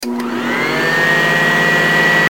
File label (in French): Aspirateur